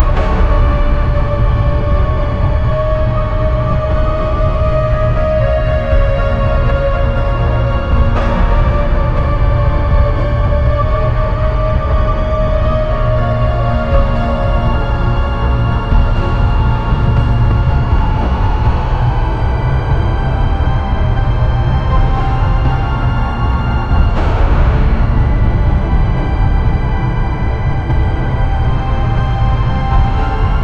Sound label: a heavy dramatic horror